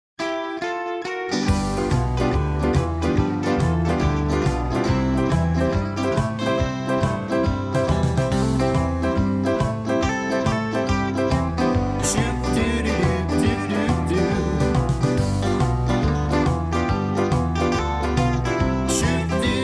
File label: with background vocals